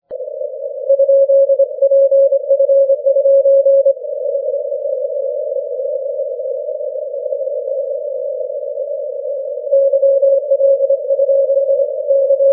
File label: 6m cw